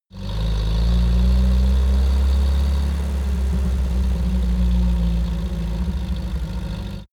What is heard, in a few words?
car uaz 469 driving short decelerate